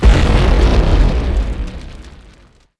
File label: hit; XBOX; Crash Bandicoot The Wrath Of Cortex